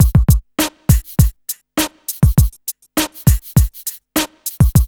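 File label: beat, hip hop R&B; USB Soundscan vol.19; AKAI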